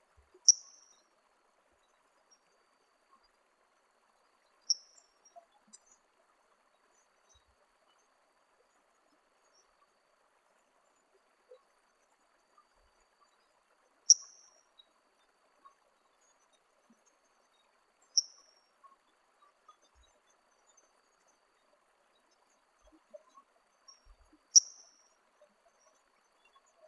Espadarana andina

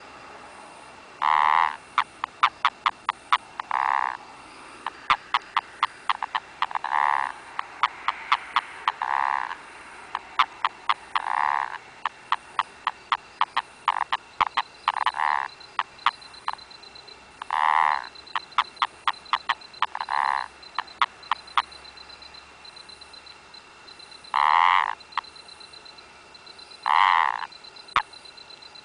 Rhinella ornata

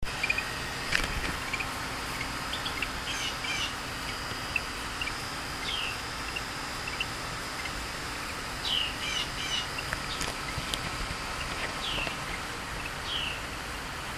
Eleutherodactylus planirostris

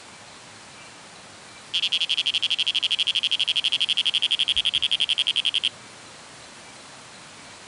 Oreophryne matawan

